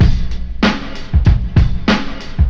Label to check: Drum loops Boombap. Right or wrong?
Right